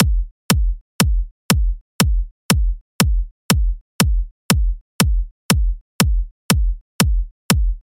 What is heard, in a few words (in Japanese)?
コンプレッサー技; EDMのキックをさらに強烈にするテクニック